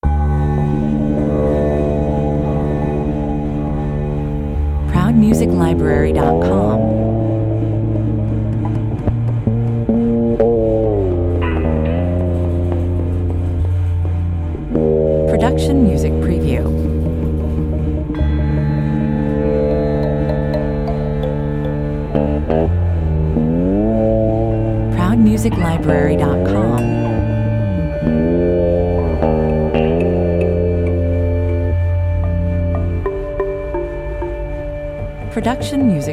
fretless hindu guitar

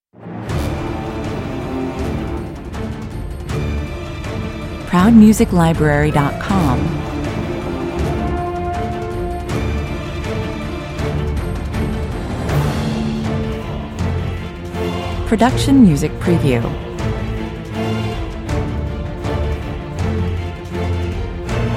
dark angels (with choir no solos lower perc)

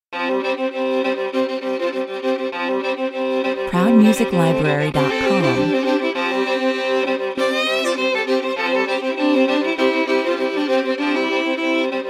fiddlin 100 bpm

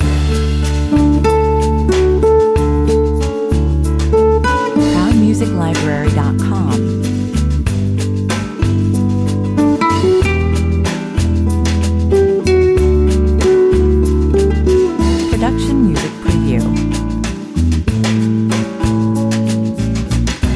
nylon romance 94 bpm

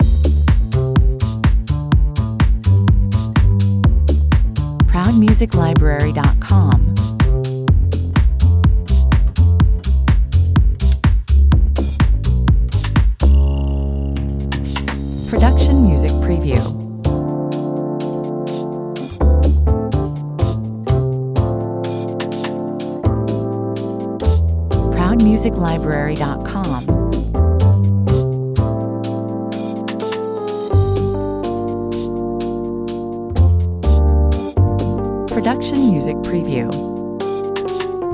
rain cat 125 bpm

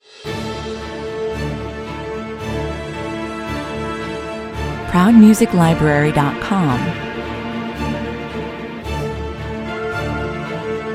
no choir no perc

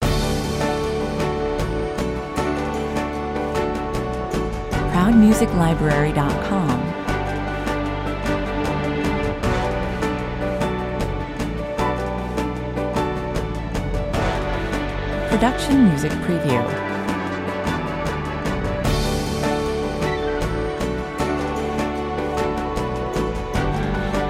daydream montage 77 bpm

